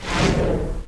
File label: player killing Spree End